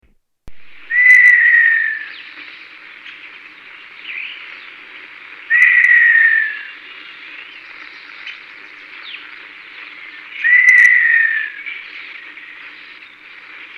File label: montezuma, upland birds